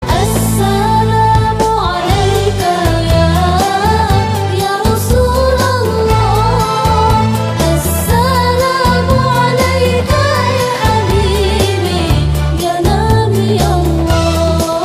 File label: Muslim Ringtone